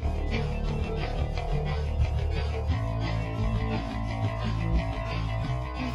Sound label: Groove